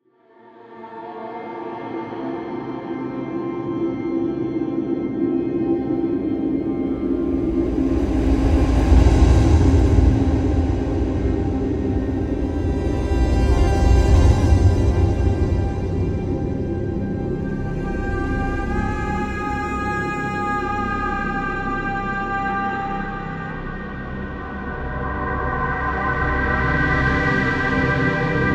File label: Desert; mechs mercs black talons linux macos windows gamerip 2015